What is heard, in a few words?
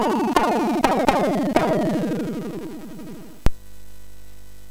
The End Jingle, MOS6581R4; hvsc